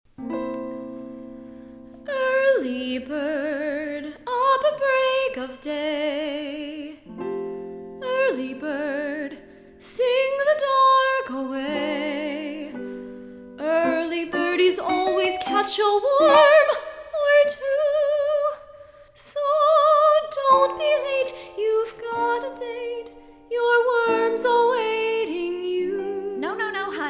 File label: Melody